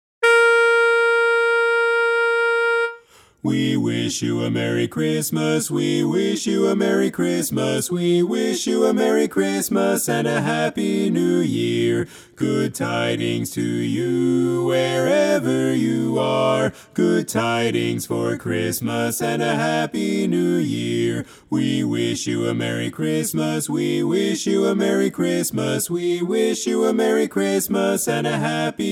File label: We Wish You a Merry Christmas (TTBB) - LEAD